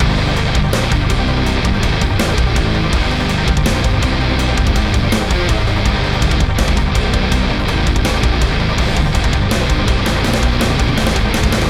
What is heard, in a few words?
Main Riff Full, modern metalcore